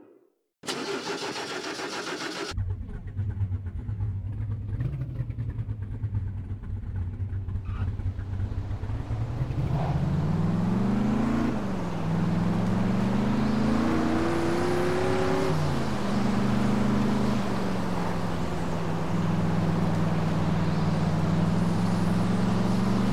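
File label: shelby gt 500, car; TDU-1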